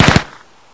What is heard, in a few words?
smg1 3 rounds; hl2